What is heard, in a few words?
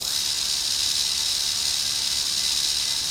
minigun R dry fire; Weapons; DCS